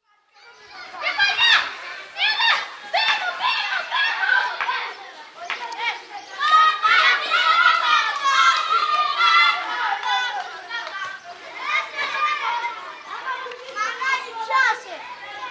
Greece: School playground, very noisy with children shouting & playing, distant traffic, bell rings & they go in; Playground Sound Effects; BBC